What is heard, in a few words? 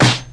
studio acoustic snare c key, Royality free snare one shot tuned to the C note